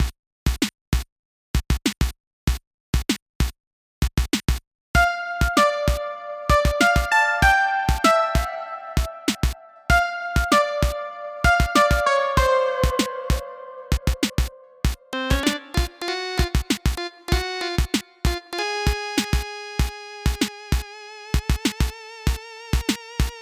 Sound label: groove dingdong; Super Holobunnies Pause Caf